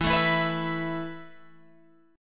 E Suspended 4th E, down, Guitar, Open G

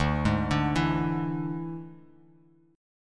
D Suspended 2nd Flat 5th D Bass Standard; 10,11,12,9; arpeggiated

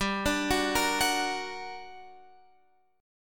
G Minor Major 7th, down, Guitar, Open F; GmM7 arpeggiated; JGuitar

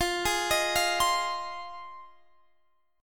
F Minor 7th F Guitar Half Step Down

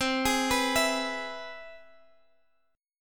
C Augmented Major 7th, Guitar Standard, chord; arpeggiated; x,x,10,13,12,12; JGuitar

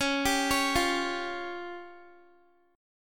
Db Major Flat 5th, Guitar; arpeggiated

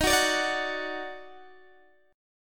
Eb Minor Major 7th Eb down Guitar DADGAD, chord

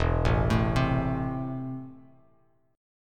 E Suspended 4th Sharp 5th, down, Bass Standard; arpeggiated; JGuitar

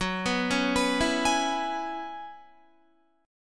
F sharp 9th Suspended 4th F sharp Guitar; F#9sus4 Chord; arpeggiated; 13,14,13,16,16,16

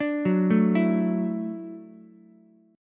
F sharp Minor 7th Sharp 5th F sharp, Ukulele; arpeggiated; JGuitar

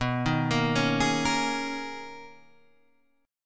B Major 13th B Guitar Half Step Down

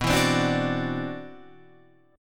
C-Major Sharp 11th B, guitar

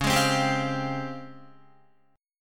Db Major Sharp 11th, Guitar; strummed; JGuitar

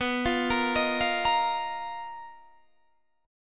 B Major 7th Flat 5th B down Guitar Open D

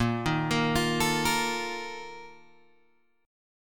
Bb Major 7th Suspended 4th, Guitar, Open G; arpeggiated; JGuitar

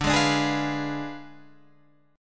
D Diminished 7th D down Guitar Standard; Ddim7 strummed; JGuitar

